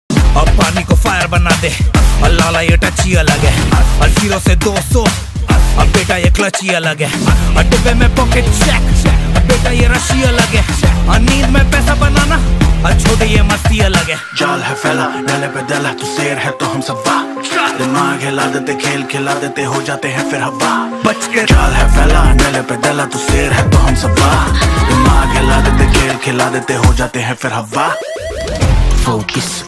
hindi rap ringtone

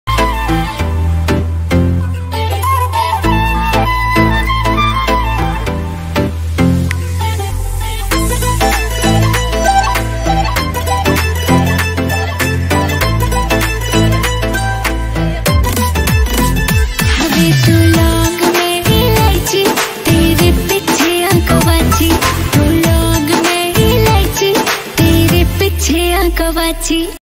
BGM ringtone

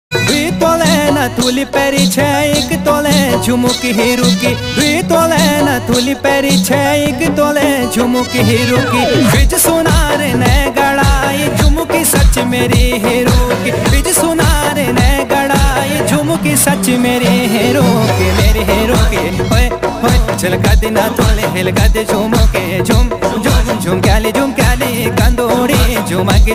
uttrakhandi ringtone

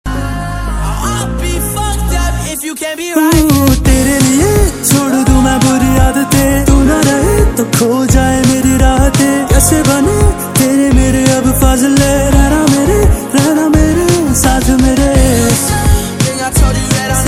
hindi version ringtone

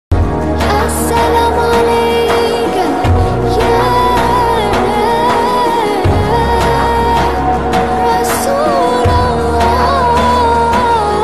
arabic ringtone

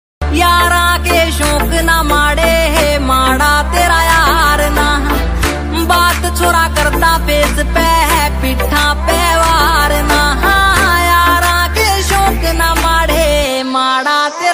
Ringtone

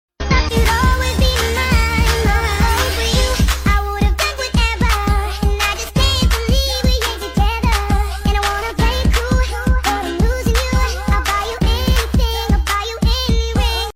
speedup english ringtone

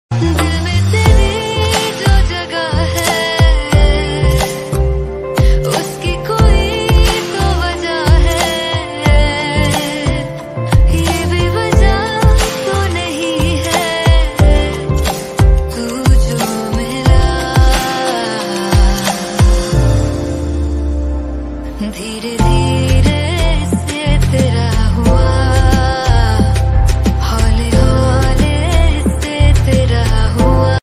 hindi ringtones

